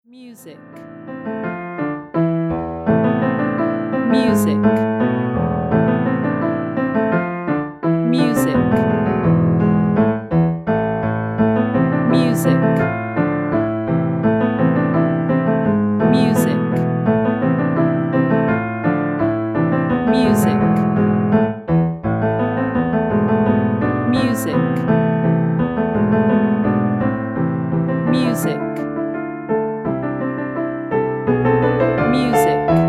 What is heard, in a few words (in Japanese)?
物語テキスト動画BGM